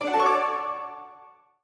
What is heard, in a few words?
low winning; Racetrack Riches; megaboard